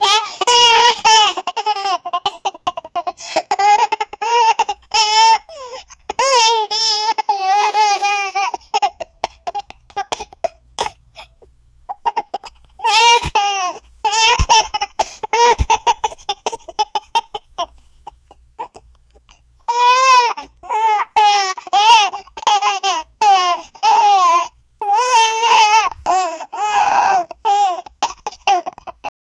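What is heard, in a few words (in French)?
rire de bébé, infant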